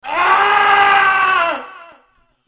death; hl2dm

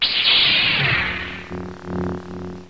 lightsaber; Dark Vader

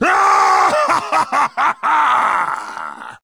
laugh; garrosh

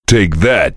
heavy laugh short; tf; duke

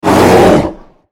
spellcast effort; Volibear

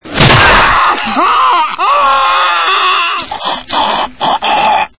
death, zombie; dod

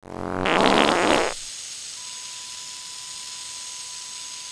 fart hiss; hl2dm

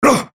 effort; volibear

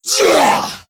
chase; slender

